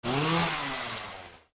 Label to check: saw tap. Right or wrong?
Right